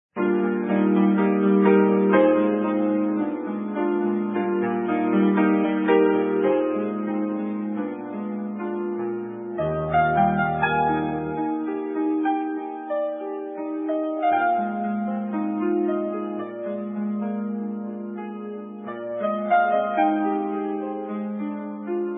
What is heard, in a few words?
piano